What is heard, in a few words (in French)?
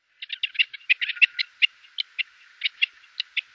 perdrix bartavelle; environnement